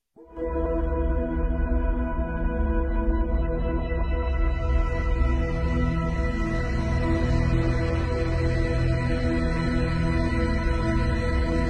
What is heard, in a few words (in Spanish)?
Cancion musica infantil